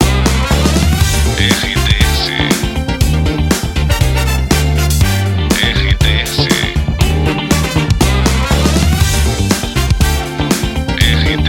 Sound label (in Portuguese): trilha para locução educativa; Inatel